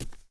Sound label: Knights Templar Walk; Playable Tutorial Level